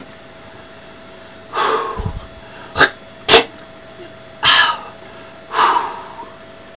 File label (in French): crachat